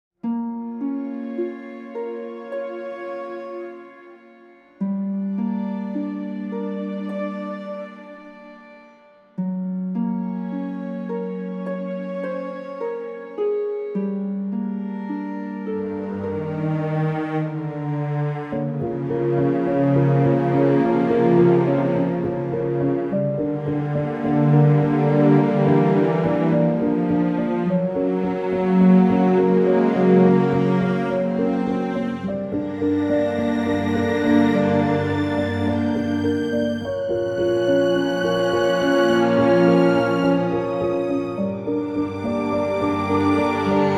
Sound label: eerie